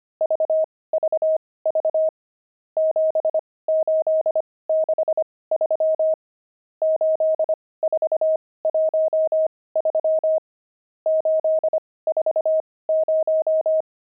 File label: pangram, intermediate pangrams files